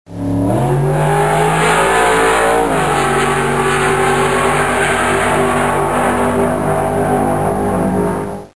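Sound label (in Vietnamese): âm thanh tiếng đua mô tô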